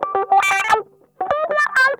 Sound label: STRANGLED, Funk Guitar; Zero G - Funk Guitar